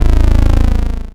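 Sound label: synth analog; Best Service Gigapack 1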